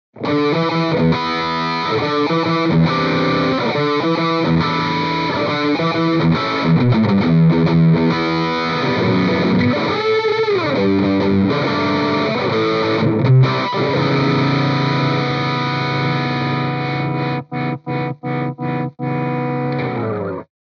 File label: Celestion Vintage 30 Distortion Les Paul